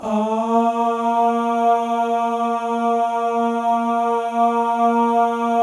MALE AHS; Spectrasonics; Symphony of Voices

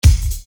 Bass Dr, EuroDance Bdrums

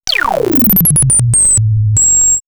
Ufo Collapse, synthfx; Concussion; X-Static Goldmine

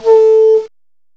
FLUTE, WIND

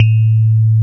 TINE; Wizoo - Powered DX; DIGIJAZZ

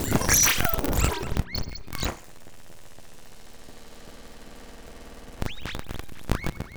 Link Error, Devices & Transmissions; Sonic Foundry (Sony Creative Software) - The Electronic Music Manuscript Collection

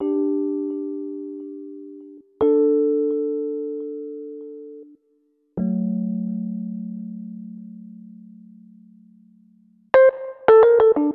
Rhodes, Cinematic Funk; Sonic Foundry (Sony Creative Software); Cinematic Funk Urban Tone Poems

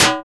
FM Perc; Rap

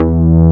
SYBA E2, Synbass; STACK BASS; AKAI S6000 CD-ROM - Volume 1; BassGuitar

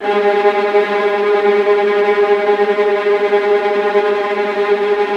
VIOLINT A#, Synclavier Strings; ILIO

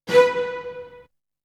Strings; ZERO G - Datafile DISC 3

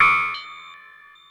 Ring mod FX

